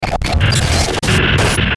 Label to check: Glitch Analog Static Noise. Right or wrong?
Right